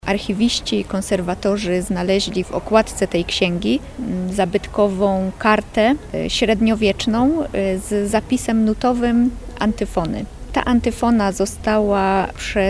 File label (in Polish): hejnal